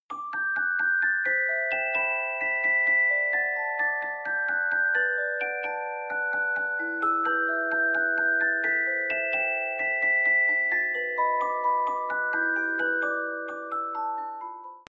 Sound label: musicbox